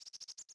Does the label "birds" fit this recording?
Yes